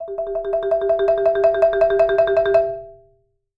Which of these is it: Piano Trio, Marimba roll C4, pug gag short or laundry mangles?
Marimba roll C4